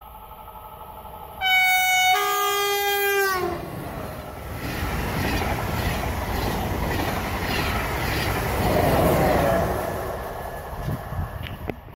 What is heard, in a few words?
TGV Duplex Horn